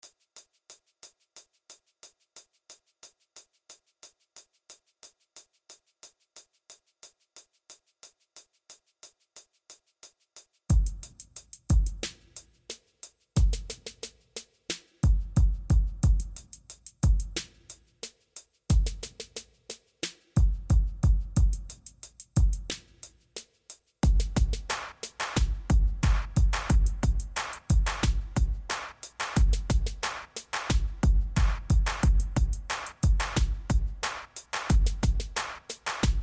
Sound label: melody